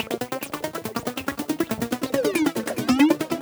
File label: ELECTRO 140 A; ELECTRO EXTRA